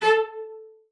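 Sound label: CELLO spiccato A4; 90 s Sample CDs; Xsample VOL-5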